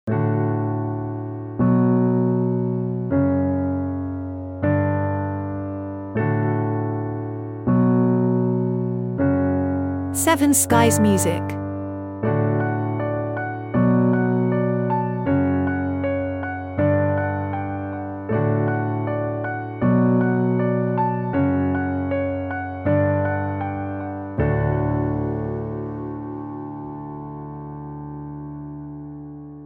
solo piano

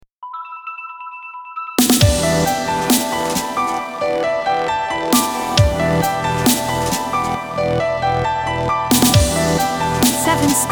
Chillstep Horizon JINGLE

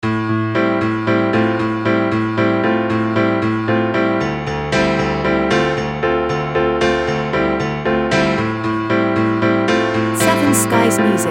Piano Funk Loop

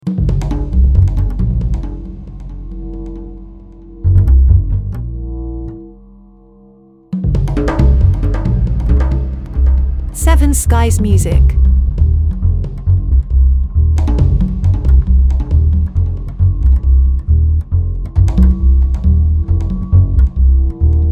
City Night suspense no melody

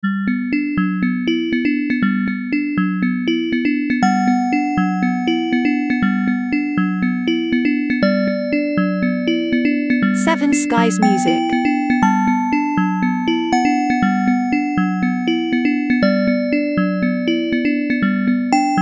Sprinkle xylophone hypnotic

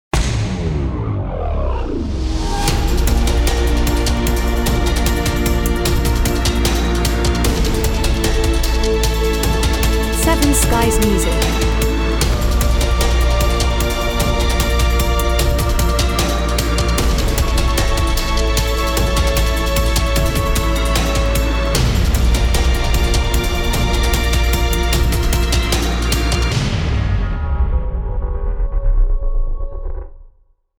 epic movie trailer

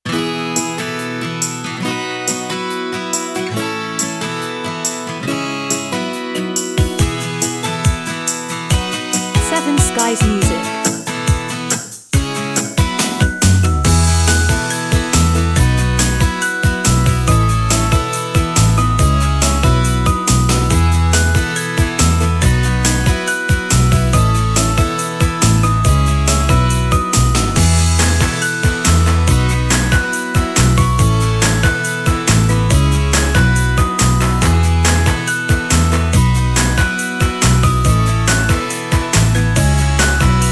Cheerful Promo

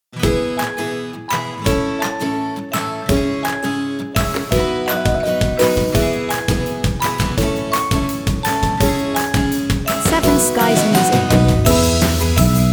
Cheerful Promo

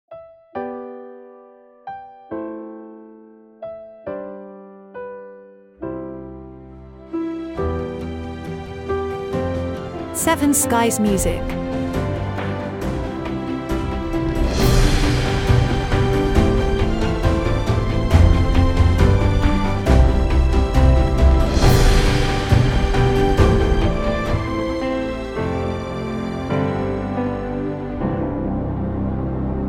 Ole Ole commercial without crowd singing; World Cup

